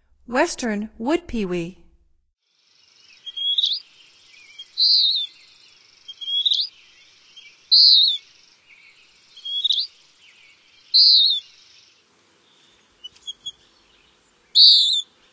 Western Wood-Pewee; North America; Stokes

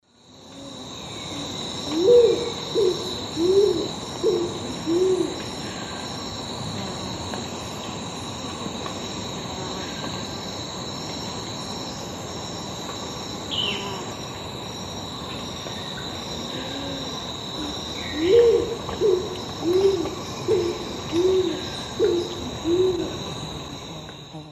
Patagioenas speciosa, song; S. America; Eastern Lowlands

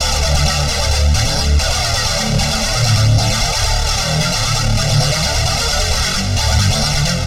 Guitar Synth; Dance; Synths Lead

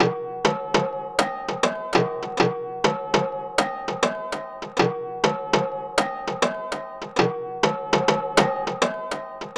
Toy Piano Orbit; Electronica; Sequences

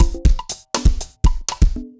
dancefloor perc 120 bpm; loops

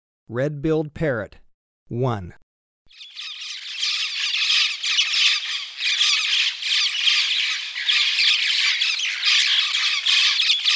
Red-billed Parrot; S. America

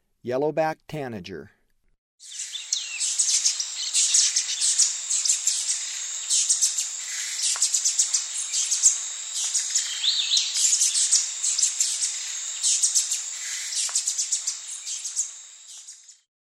Yellow-backed Tanager; S. America; Eastern Lowlands

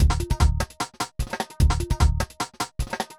bangra 150

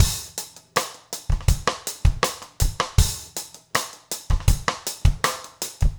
trip hop rims

